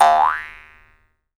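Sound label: Jaw Harps, Pitched Percussion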